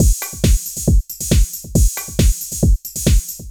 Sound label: Beat, Old Skool House, 137bpm; Belfast